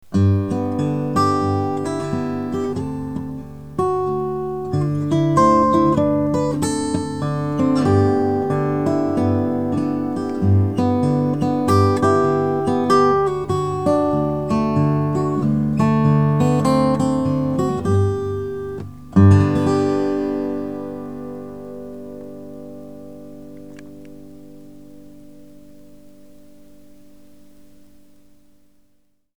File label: gtr mix; iPod5; iTalk